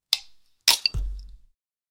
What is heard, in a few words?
soda open; beverage